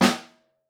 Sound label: SN Room, Snare